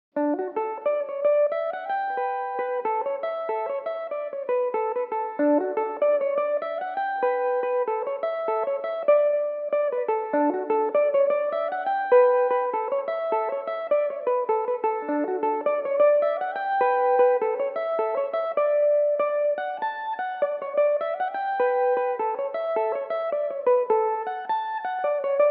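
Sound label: cape breton jig D major belmuse, irish mandolin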